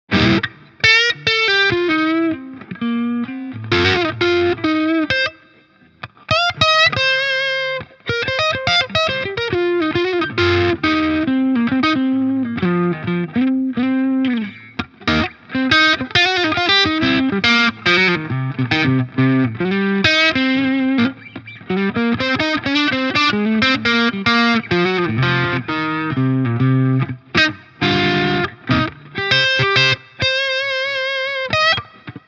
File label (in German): Halstonabnehmer HB crunch; Harley Benton MR Modern CAR